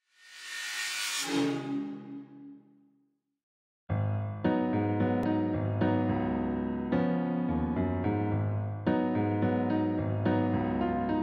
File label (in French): Benedictus Sopranos